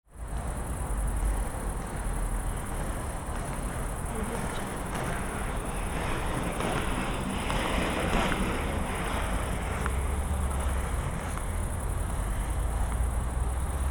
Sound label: city cycling and walking route night ambience; urban sounds